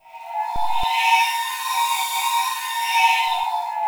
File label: APPARITION, SPIRAL WINDS; Spectrasonic Distorted Reality 1